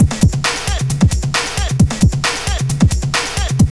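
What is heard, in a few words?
drum loop; X-Static Goldmine 3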